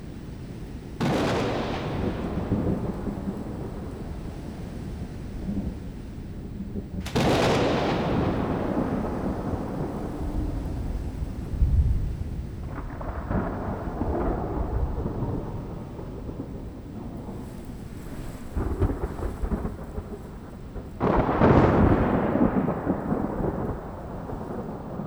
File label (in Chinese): 雷神殿音效; 2021西路背景音效